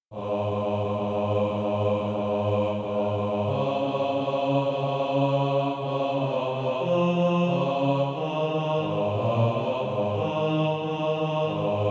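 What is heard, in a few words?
bass, Practise Music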